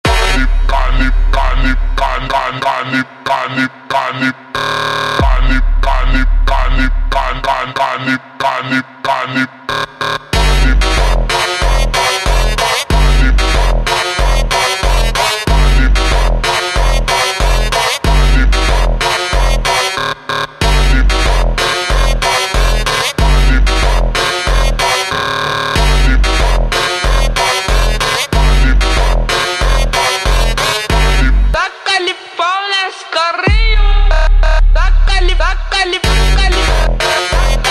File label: Phonk ultra Slowed ultra Slowed